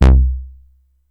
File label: Synth one shot Stab D, Bass; Slate Digital BASSHOLE Sample Pack